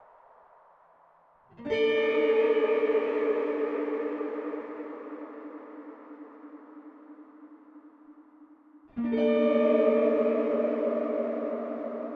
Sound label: Hall 224; Golden Reverberator